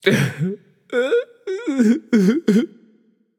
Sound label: boo hoo; smite; achilles